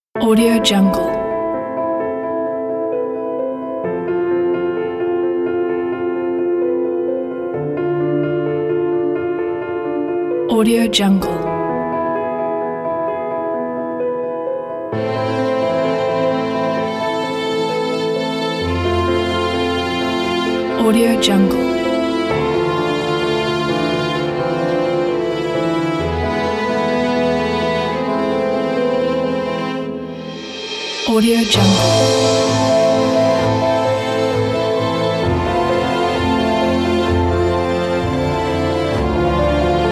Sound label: touching emotional piano; wmaxmusic